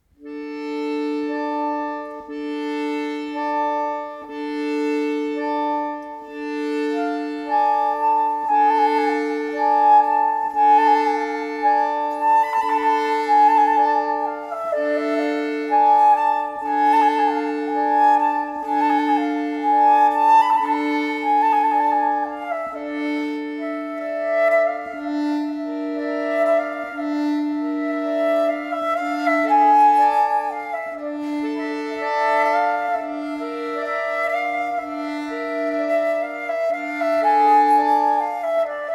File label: Romanian Folk Dances; B. Bartók; No.3